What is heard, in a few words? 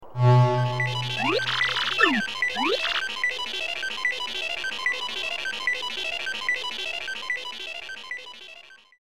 bomb cannon online; Paper Mario 2 The Thousand Year Door